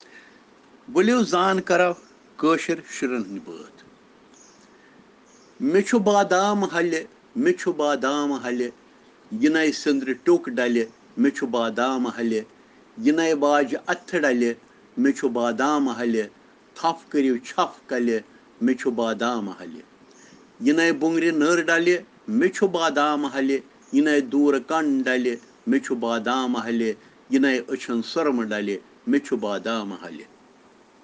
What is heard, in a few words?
Children Rhyms in Kashmiri